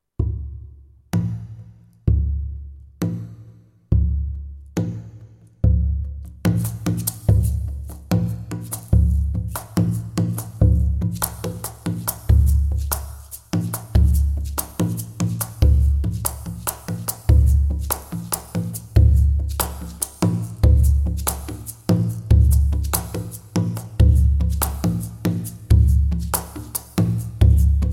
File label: Drum